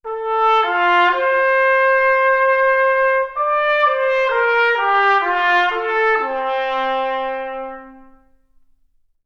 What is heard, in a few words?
trumpet; OmniSource